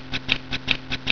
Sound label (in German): SCHERE